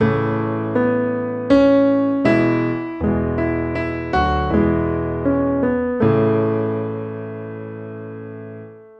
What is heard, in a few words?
melody with harmony